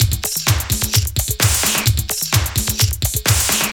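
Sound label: drum loops, right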